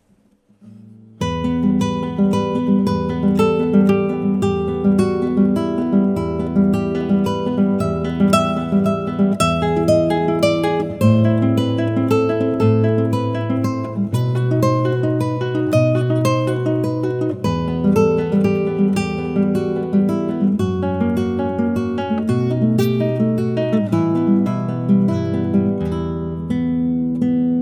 Romance for Guitar

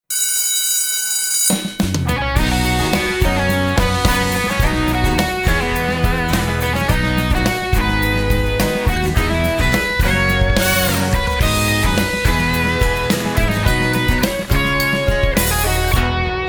Roars Transition

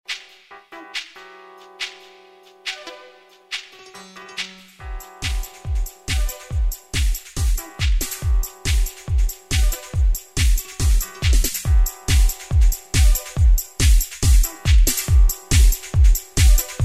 groove

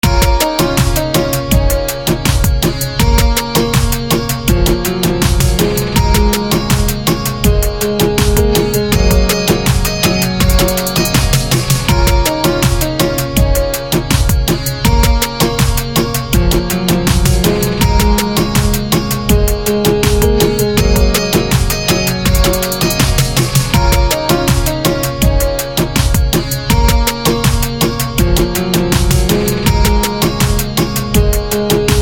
reggae

